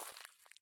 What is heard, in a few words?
walk grass low; Escape from Tarkov